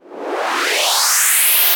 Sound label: Sweeps Up, Effects